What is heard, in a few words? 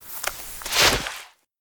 Fall; LOOPERS